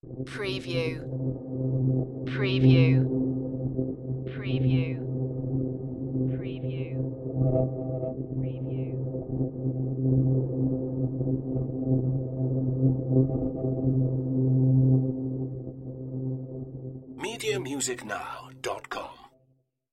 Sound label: Forest Atmosphere